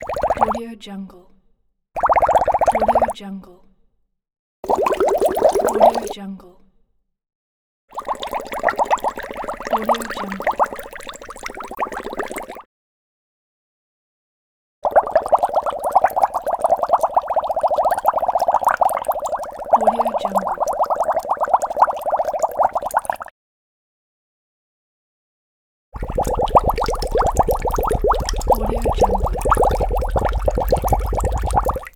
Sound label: bubbles